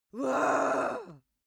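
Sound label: female aggressive, screams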